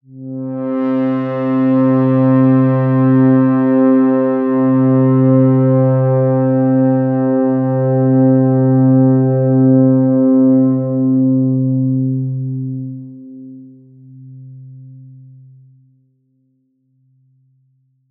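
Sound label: strings C3, ARP 2600 Pads; Polyend; Simulacrum Sounds; 1 Shots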